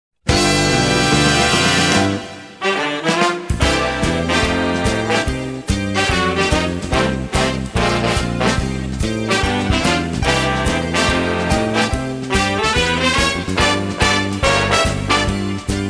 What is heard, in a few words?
Swing meeting